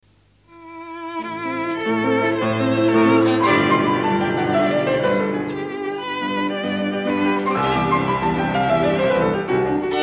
Sonata No. 1 in D for Violin & Piano Op. 12 No. 1 II. Tema con variazioni; Beethoven